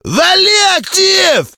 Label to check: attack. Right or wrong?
Right